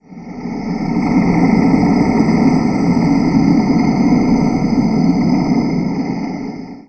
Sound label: zombie